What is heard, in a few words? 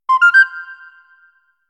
message Alarm; ucap-webmessenger-electron; notification